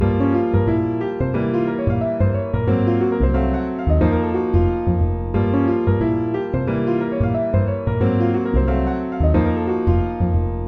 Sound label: harmonizations